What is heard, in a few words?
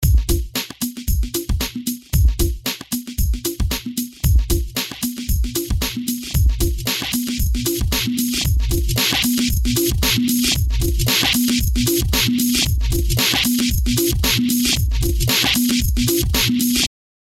Drumloop auto gain; IL Harmor